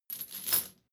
drawbridge chain put down; maschinen; metall; soundpool

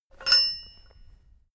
metal bolt ring; industrie-wirtschaft

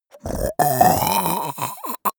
monster choking zombie; comedy-cartoon; soundpool

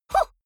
female fight huh short; vocals; comedy-cartoon; soundpool

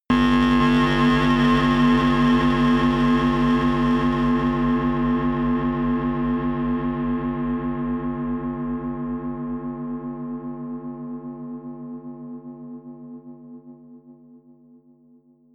stinger hum, drones; musikelemente

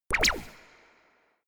space laser gun double shot; krieg-waffen; soundpool

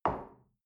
shell place on table soft short; berufe; gastronomie; soundpool

